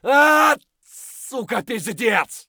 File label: hurt heavy; Escape from Tarkov